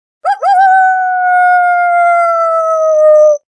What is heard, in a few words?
Lone Howl Locator